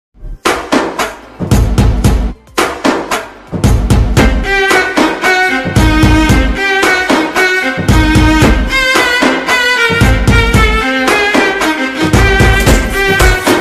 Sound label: remix attitude ringtone bgm